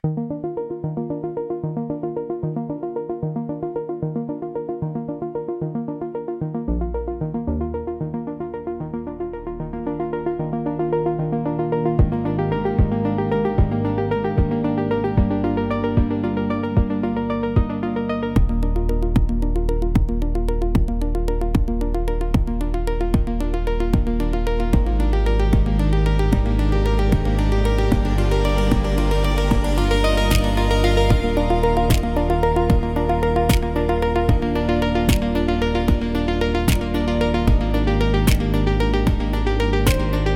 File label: Ambience, chillstep